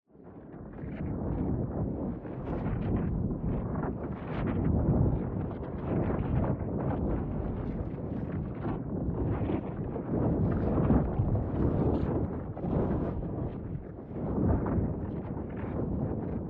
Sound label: AMBIENCE Thunder Cloud Swirling; boom library; Matter